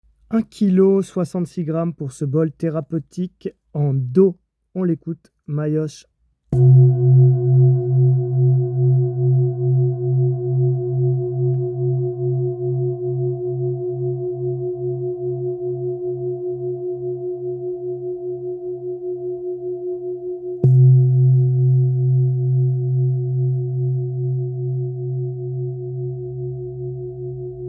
Bol Tibetain Martele en Alliage 7 Metaux Therapeutique 21 cm

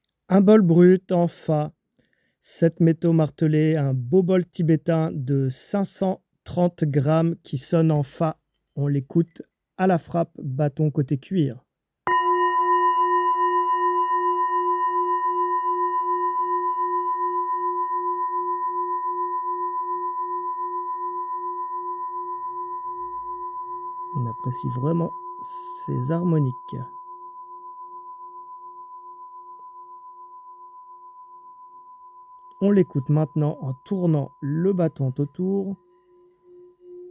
Bol Tibetain Martele 7 Metaux Aspect Brut Note FA

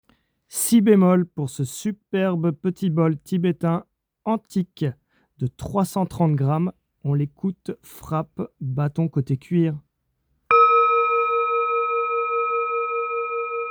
Bol Tibetain Antique Note Si bemol 11 cm

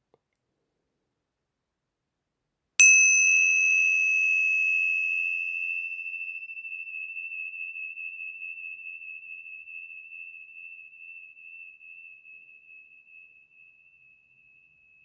Tingsha tibetaine 7 metaux 77 mm Resonance naturelle en Mi

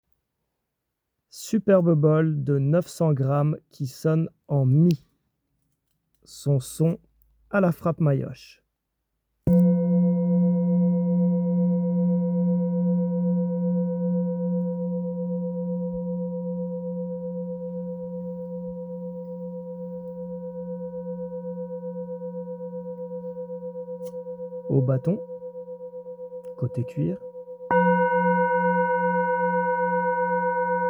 Bol Tibetain Grave Martele 7 Metaux Note Mi

